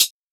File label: hat; Anthemic